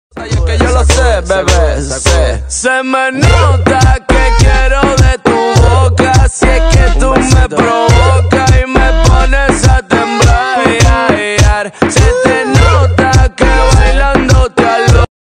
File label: Spanish ringtone